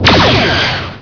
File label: fire projectile, weapons; xshipwars